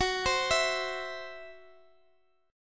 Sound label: E Suspended 2nd Sharp 5th